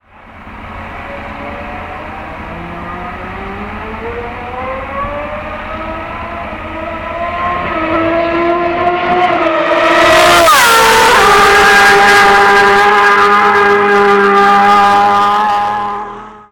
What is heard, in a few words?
desmosedici straight, motos; La Ducati Desmosedici 2003; Loris Capirossi; 230 Ch à 16500 tr/mn